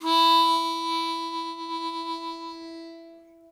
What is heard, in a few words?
harp E3 F; interstitial